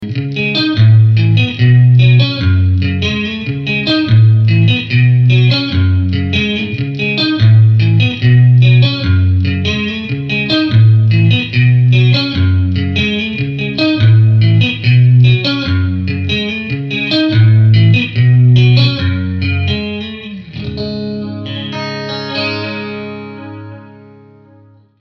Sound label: Vintage Chorus